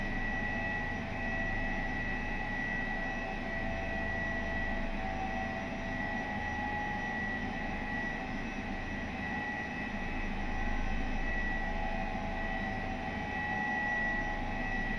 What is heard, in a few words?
H145 Flight In left, Headset; ec145